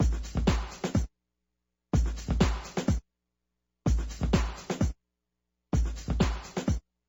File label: breakbeat